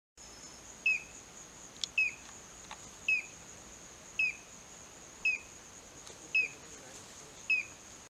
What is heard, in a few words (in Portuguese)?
Canto do meia lua elegante